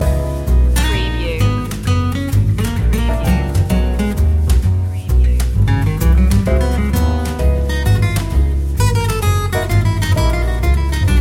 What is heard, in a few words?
swinging life loop